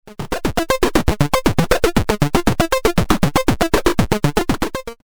Pll bp sweep; experimental

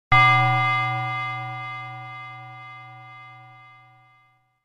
Grandfather's Clock

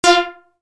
Steeldrums

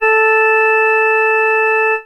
Ganymede Organ Gamma 440Hz

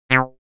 Shorty, synth lead

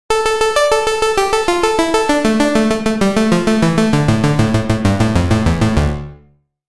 synth lead

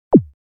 E-Tom Low, e-drums

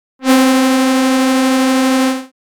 Wide, synthlead

